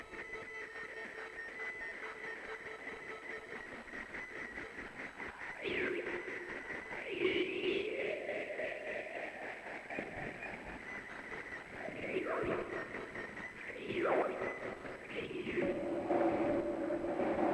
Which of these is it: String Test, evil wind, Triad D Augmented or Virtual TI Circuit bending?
Virtual TI Circuit bending